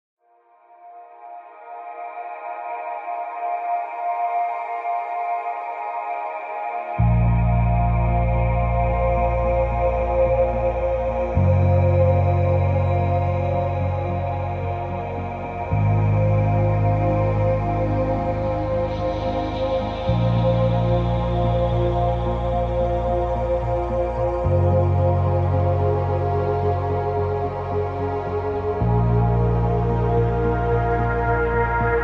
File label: No Glockenspiel Mix